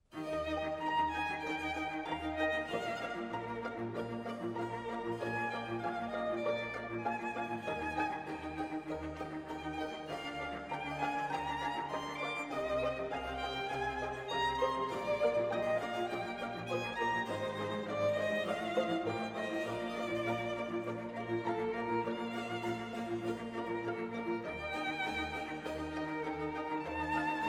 Concerto for Violin and Strings in F Minor, Op. 8, No. 4, RV 297